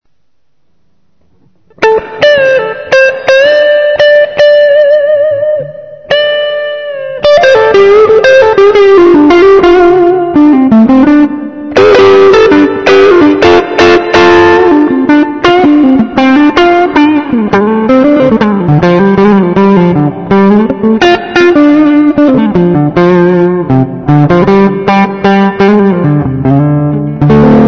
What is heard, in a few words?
HB Neck clean lead, guitar pickups